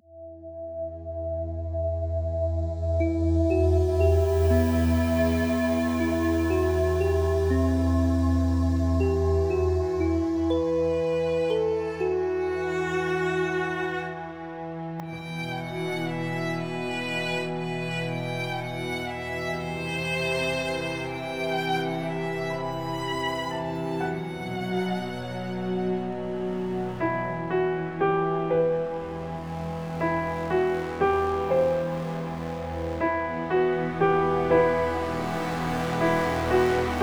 deep emotional piano

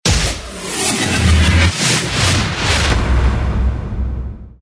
Galery Noise; Sound Ideas - Galery Noise 001

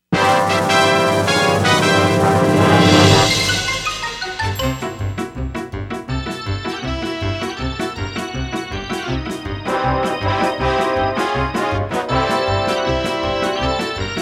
Comedy Style; Sound Ideas - Comedy Styles

